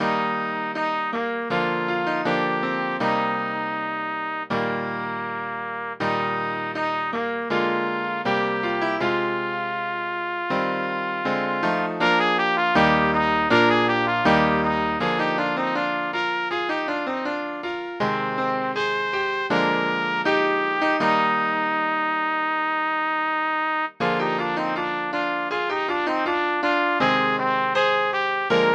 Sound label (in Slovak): pre trúbku a klavír; Dialóg